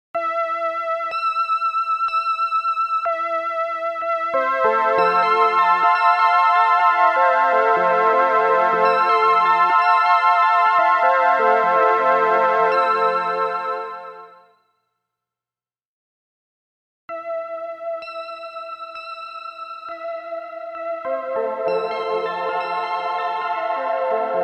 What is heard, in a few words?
Cathedra Organ